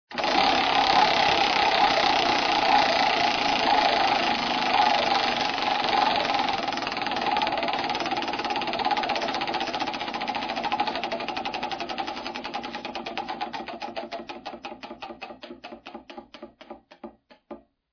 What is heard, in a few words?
Wheel Sound, spin to win